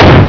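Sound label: Snare; Sound Library 2000; Wayzata Technology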